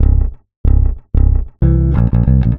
Electric Bass; Basses

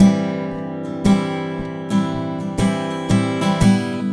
Acoustic Guitar; Guitars

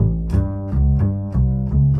Acoustic Bass, Loops

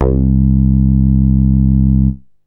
BASS; SoundFactory; SMPMANIA

